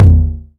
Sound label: Tom 909; One Shots; Drums